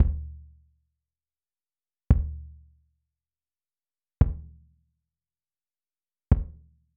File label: 114 Fife N drum Kick